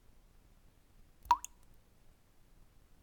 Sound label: Water Drop